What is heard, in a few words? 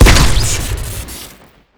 scatter sush shoot crit, weapons; tf2classic